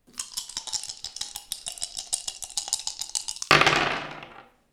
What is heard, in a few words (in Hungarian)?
kockavetés, dobókocka; perkupa